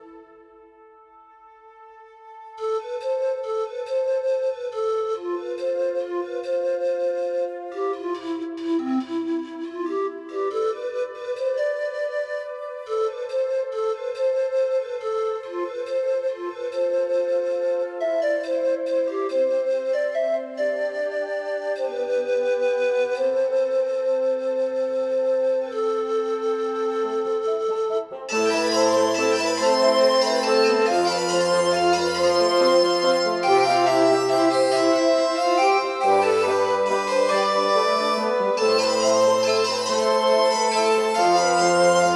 Music Resurrection Woodwind; 7 Mages

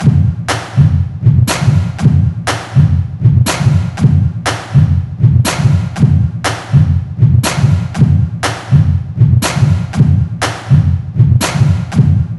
Loading; NCAA March Madness 08; PS2, PS3, Xbox 360

